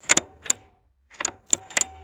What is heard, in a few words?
metallic mini bike brake lever clicks